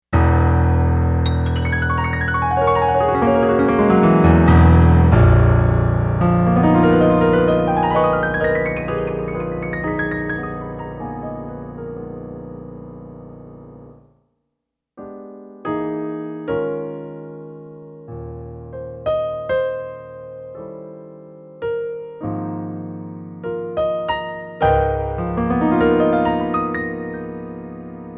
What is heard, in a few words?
piano